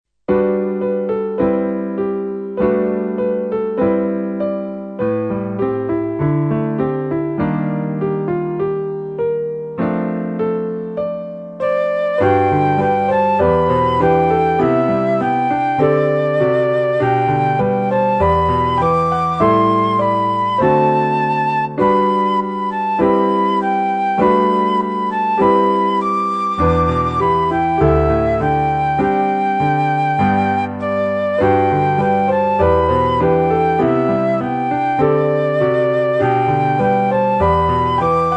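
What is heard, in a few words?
flute and piano solo; Tapachula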